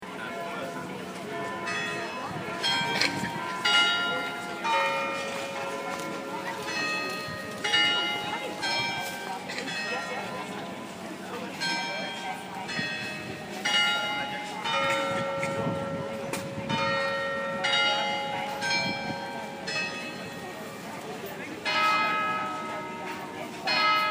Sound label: Carillon